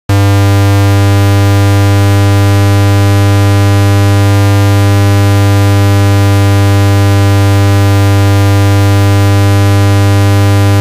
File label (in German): Wechselstrom 50Hz